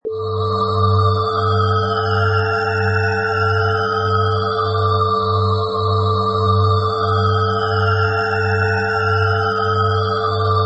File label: Sweep; Forma